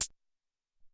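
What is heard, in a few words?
Synth Hat, drums; Alesis SR-18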